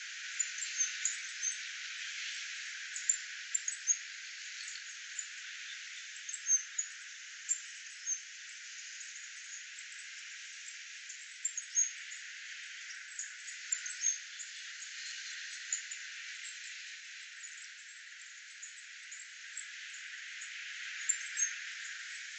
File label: Cuban grassquit